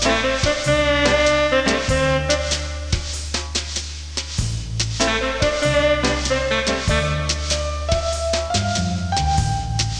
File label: Sax